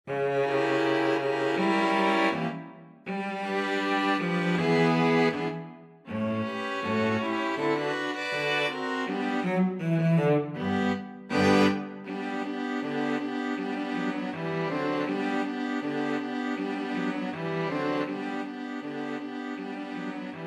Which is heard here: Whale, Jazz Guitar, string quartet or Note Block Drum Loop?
string quartet